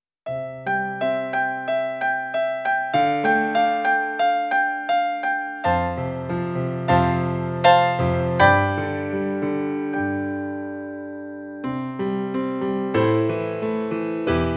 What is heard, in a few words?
Piano